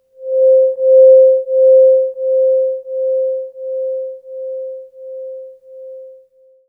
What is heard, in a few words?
C4, Synth Line